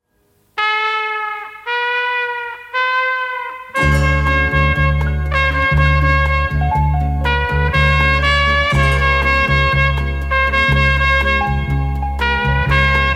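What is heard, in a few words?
Christmas ringtone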